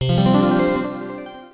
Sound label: Light Gem